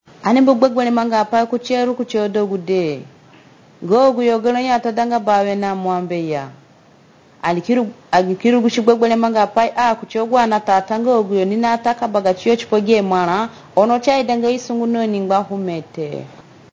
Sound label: Radio Jingle; ROA; Azande